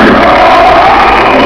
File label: gurp; quake2; grimlock